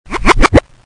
foof

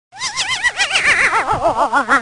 buggy

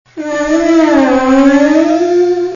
sad binary

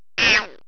buzwad

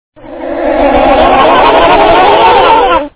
insects talk

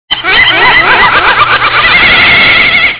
wiffle woof

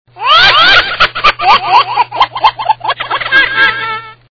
mouse laugh

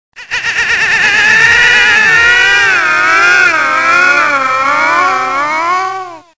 drainer

